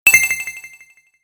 Digital Chime Echo Engage, also telephone SMS message receive; Digital Sound Effects